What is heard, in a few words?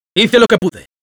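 jeers, Spanish; ¡Hice lo que pude!.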